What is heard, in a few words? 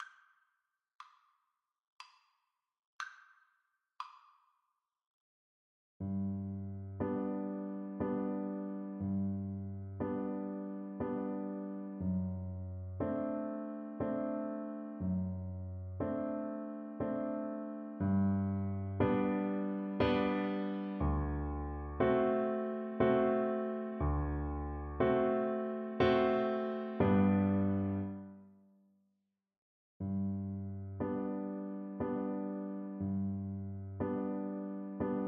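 german dance violin karaoke; beethoven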